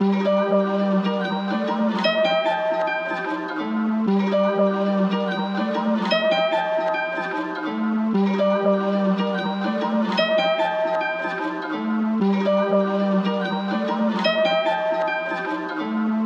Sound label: 118 BPM G Min Guitar; Trap; Loop Stems; Cymatics; 2022 Melody Collection; Trap Pt 2; Panama